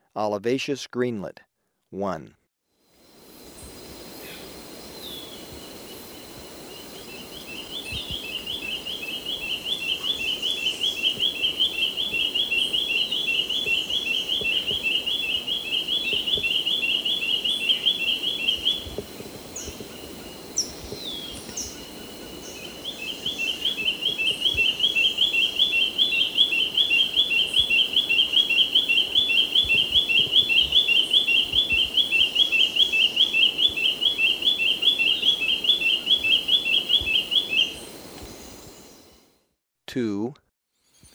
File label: Olivaceous Greenlet, Birds of Ecuador; S. America